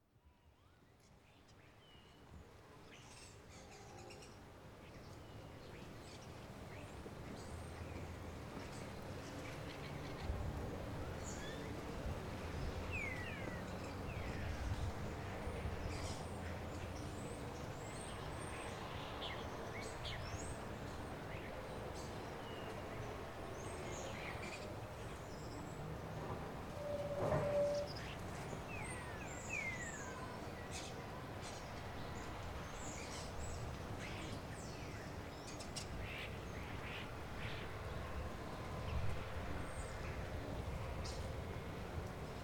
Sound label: mont saint michel early morning soundscape